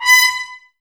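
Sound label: Brass trumpets B, Mute; Miroslav Vitous - Woodwind Brass Ensemble; Tps Mute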